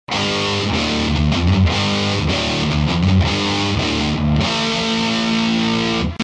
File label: badcat double gain